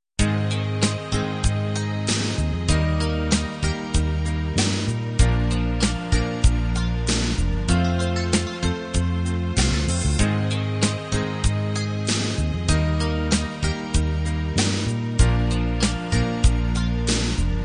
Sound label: rock ballad